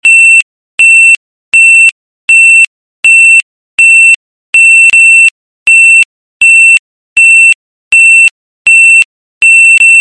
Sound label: 1 min of beeps